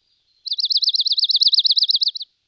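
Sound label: bird chirp; EnvSound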